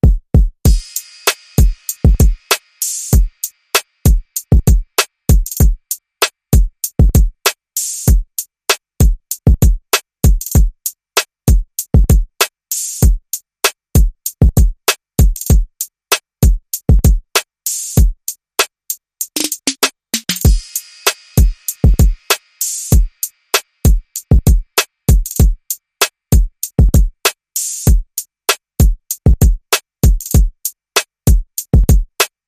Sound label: Retro Beat